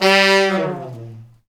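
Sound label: Brass Fat Falls; Roland L-CD702; Fat Section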